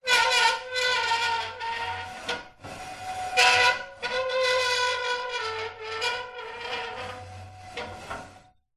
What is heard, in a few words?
industrial ladder metal slide movement screech metal